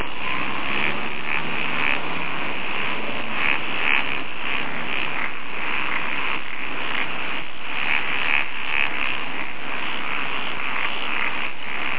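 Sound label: frog, Wrinkled frog; tuti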